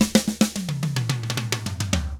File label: SMBA, SAMBA; ILIO; Double Platinum Drums 1